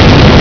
asscan fire, weapons

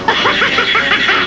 bump; starscream

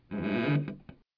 creaky wood

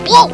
jump

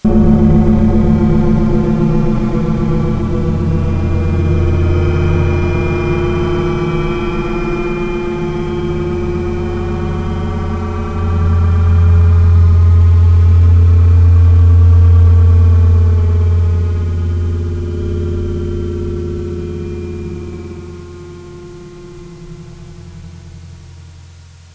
melting metal

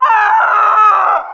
widow pain; rogue

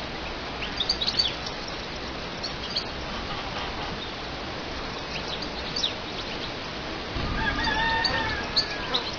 ambi

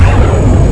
rip; vortex; weapons; hook

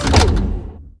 menu; baseq2

